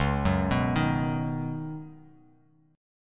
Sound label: Db 9th Suspended 4th Db down; arpeggiated; JGuitar